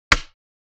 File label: Switch Light Light Sw; Stair Horror